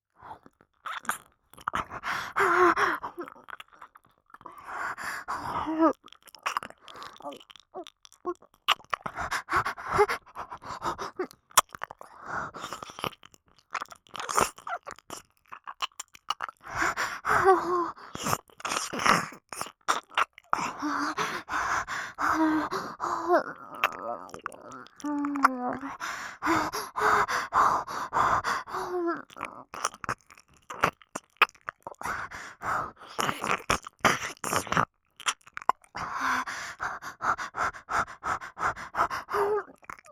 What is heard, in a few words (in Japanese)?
密着右耳舐め奉仕; 理性崩壊！密着右耳舐め奉仕